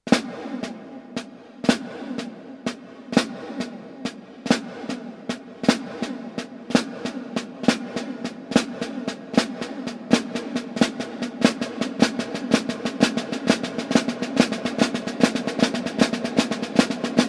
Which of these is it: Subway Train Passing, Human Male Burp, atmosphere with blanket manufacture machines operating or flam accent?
flam accent